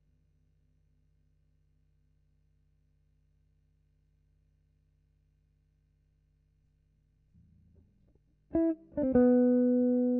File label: GTR B